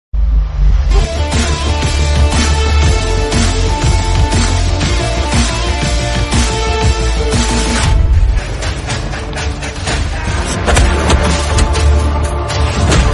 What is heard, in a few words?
telugu bgm ringtones, south indian bgm ringtones; mosagallu movie